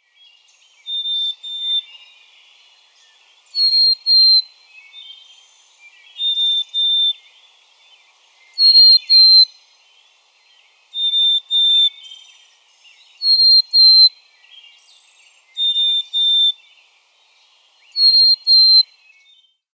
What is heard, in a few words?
chickadee; Sci Am; MVineyard